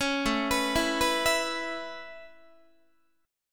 A-Major Add 9th Guitar, Aadd9 chord; Aadd9 arpeggiated; JGuitar

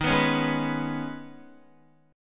Eb 7th Suspended 4th Sharp 5th, Guitar; Eb7sus4#5; strummed; x,6,9,6,8,6; JGuitar

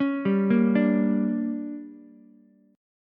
D Major 7th Suspended 4th Sharp 5th, Ukulele

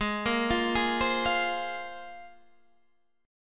C 7th Suspended 4th Sharp 5th

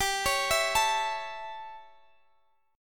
A Major G; arpeggiated

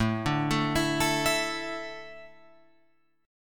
A Suspended 4th down Guitar Open F; Asus4 arpeggiated; JGuitar

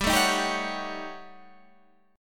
D sharp 7th Sharp 9th Flat 5th

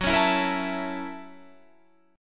Gb 7th Sharp 9th Gb down Guitar Half Step Down

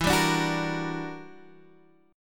E Minor 7th Sharp 11th; Em7#11; strummed

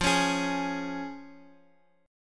F Minor Sharp 5th, Guitar Open D, chord

